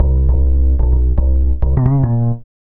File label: B LOOP